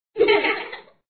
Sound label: happy Child; mom mania